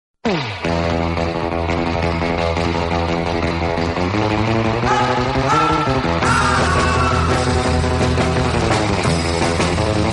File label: instrumental music; pulp fiction